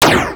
魔法 炎; 3(1秒)

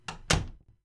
木戸閉

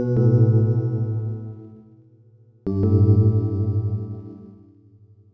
屋敷内BGM; ゆめ2っき

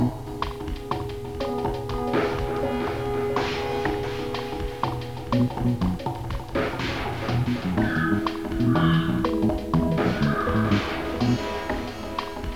ループ「海」; By音師竹内; ゆめ2っき

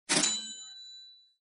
レジ開け

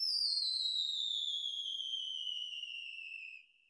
打ち上げ花火笛; ゆめ2っき

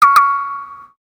キャンセル音

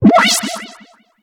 困惑

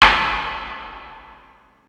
妖怪 足音

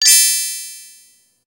キラリーン♪

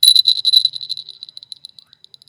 suzu

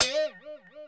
バネ

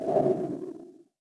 kokyuu ho

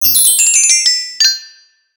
キラリーン♪

